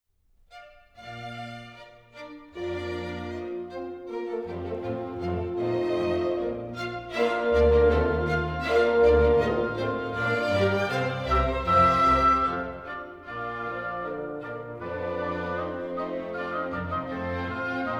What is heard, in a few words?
Gavotte B-Dur; Mozart; Serenades-Divertimenti-Dances